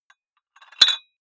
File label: dumbbell put on very small weight hit